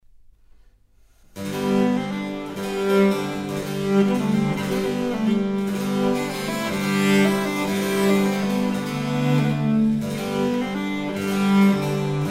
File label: Musette; Caix